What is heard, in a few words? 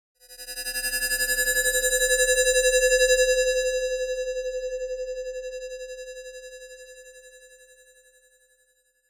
sound design fm high pitched drone metalic build; zapsplat